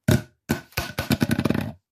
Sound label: Cardboard pipe falling